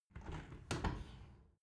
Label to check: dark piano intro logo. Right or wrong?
Wrong